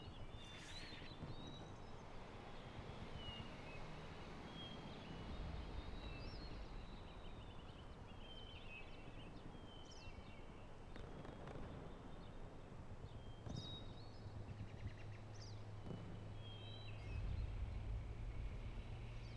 dirt roads; WAR

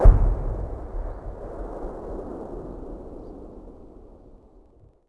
distant gun; WAR

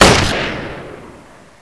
sniper; axis; WAR

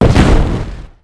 destroy boom; WAR

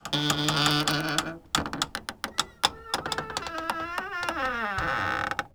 door wood old creak; WAR

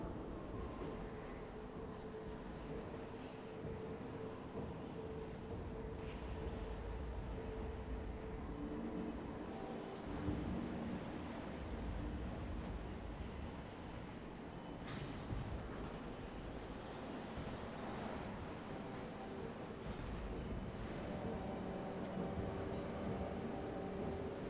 no battle w wind; WAR

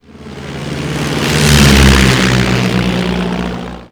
henschel pass; WAR

